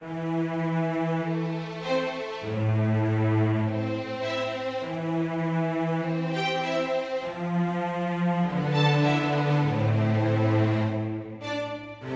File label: mysterious forte